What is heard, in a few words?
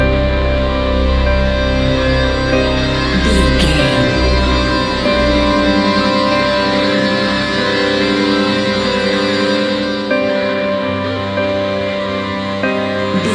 ephemeral shadows modern horror